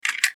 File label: Put Out a Tool; Rei Yumesaki